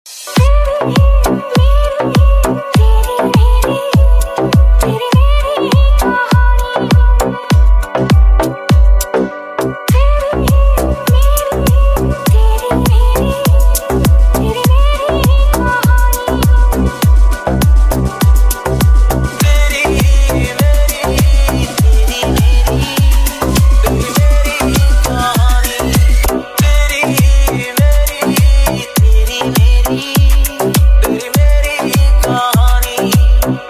Ringtone

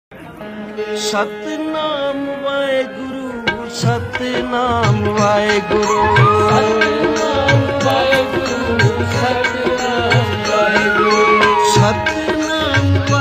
Satnam Waheguru Ringtone

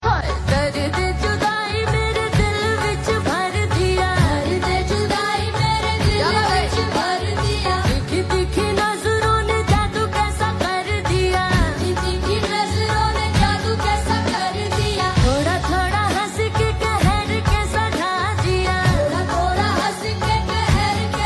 Best Romantic Ringtone

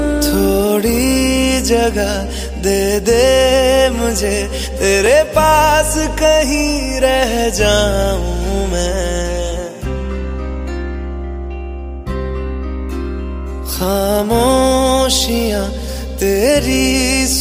Valentine Day Song Ringtone

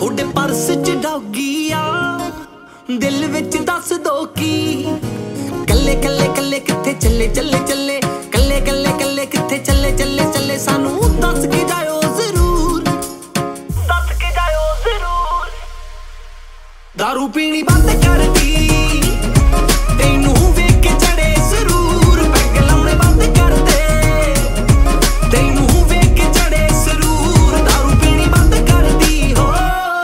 New Punjabi Song; ringtones

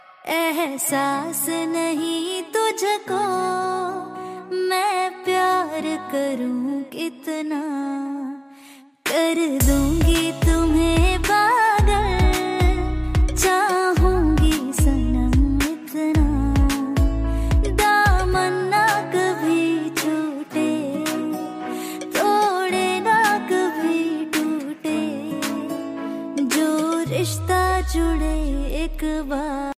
Female Ringtone

